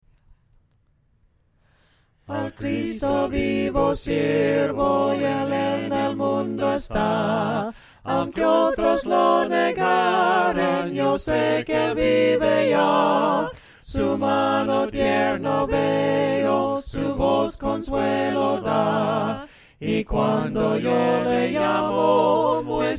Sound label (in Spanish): AL CRISTO VIVO SIRVO, himnos a capella; cantos del camino